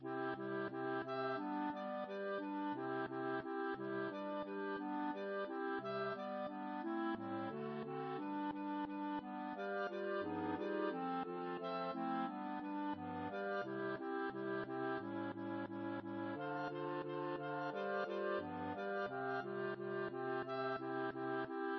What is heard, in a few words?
Clarinet sonata, MIDI; Challenge